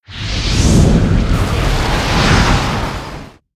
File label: Fly For Insanity